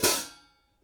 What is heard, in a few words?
hat